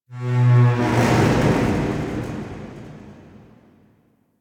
Metal Rumbles; Warframe; Quests; TheNewWar; Ambience; Zariman

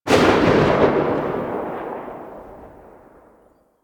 AK47 Tail; Warframe; Tenno; Weapons

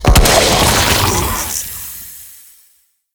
Infested Drop Pod Destroy; Warframe

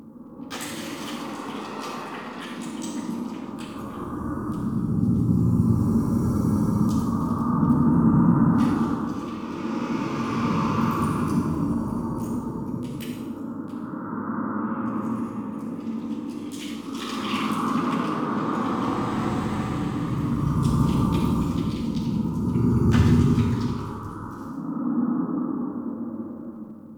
Under City Small Room Rocky Ambience, Dungeons; Beds

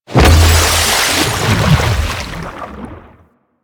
Pilot Breakout Hit Three; Warframe; Amalgam Carrus

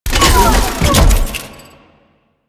Moa Fabricator Spawn Start; Warframe; Quests; The New War; Veso

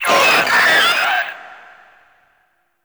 Infested Scream; Entrati Labs; 1999 Outro Cinematic

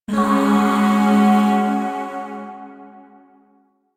Shawzin Yo Chord G; Music; Chords; Warframe; Zariman Void Shawzin; DE

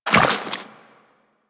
Step Goo, Footsteps Walk; Warframe; Tenno

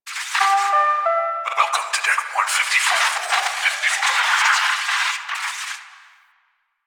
Enter Chimes; Ambience; Warframe; The New War; Quests; Zariman

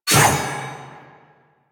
Sword Whoosh; Enemies; Weapons; DE; Warframe; Teshin

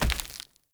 Walk Default, Footsteps; Avakot; Friendly

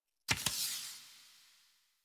Tarot UI Card Slide In A; Warframe; Harrow; Tarot Emotion Choice

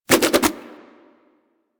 Nunchuck Spin Whoosh; Warframe; Infested

